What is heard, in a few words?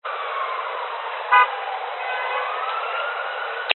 technology cb radio burst static city background siren horns